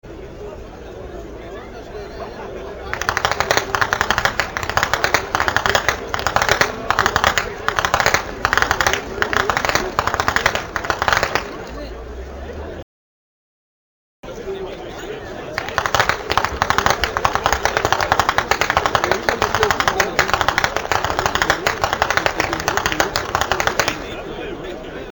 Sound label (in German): schnalzen; anthering